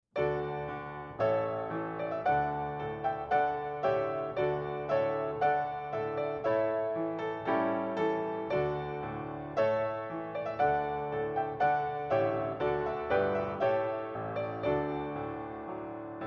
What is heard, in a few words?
trumpet